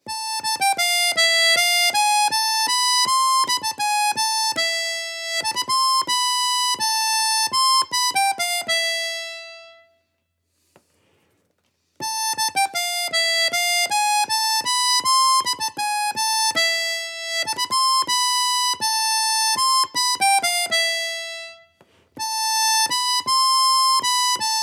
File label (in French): Contre-chant